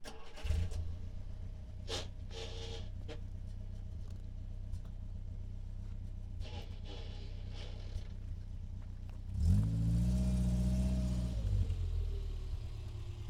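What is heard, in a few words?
volvo pv444 exterior start slow reverse stop off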